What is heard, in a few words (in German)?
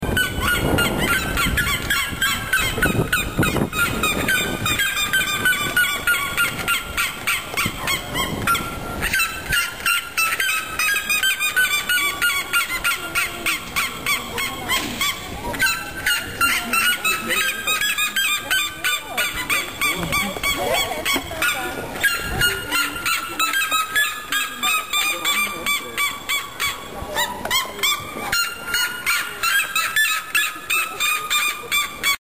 seriemas rufe